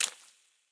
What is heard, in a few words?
footsteps mudroot; Fate The Cursed King